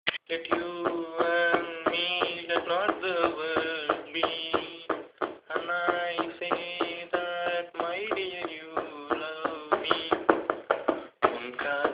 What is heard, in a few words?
Classical Song